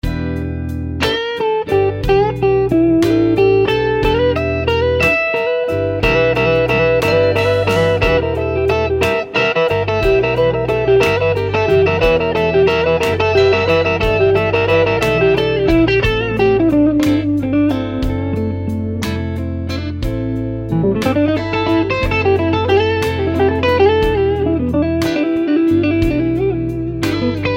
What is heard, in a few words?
Blues guitar